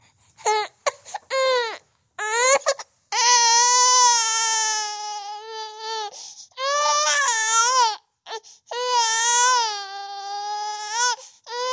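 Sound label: sounds of newborn crying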